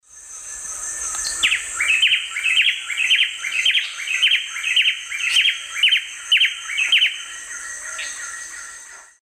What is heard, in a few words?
Cantorchilus leucotis, duet; S. America; Eastern Lowlands